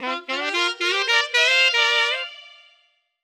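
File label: Tenor Sax, Harmonized Reverb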